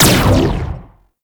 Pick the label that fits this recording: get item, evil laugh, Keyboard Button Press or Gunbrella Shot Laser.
Gunbrella Shot Laser